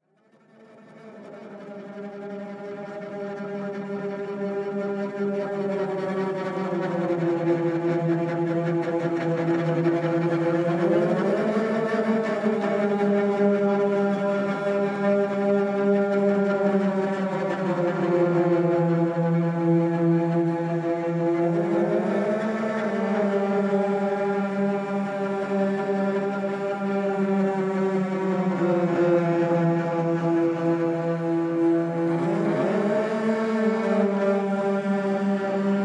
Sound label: Cello TAPE ECHO St